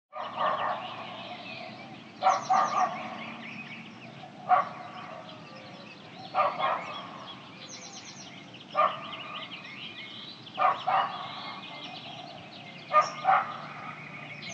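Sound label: Traffic Hollywood 1966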